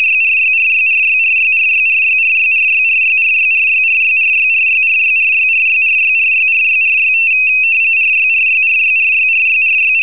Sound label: FSK 60db, rtty